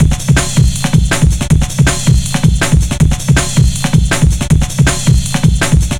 Drum Bass, Drumloops, 160bpm; Zero-G; Total Drum Bass

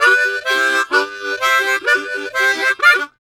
Harm 129bpm G, Harmonica

